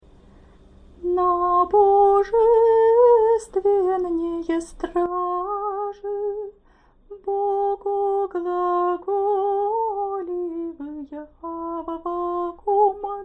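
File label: easter canon byzantine hirmos slower, singing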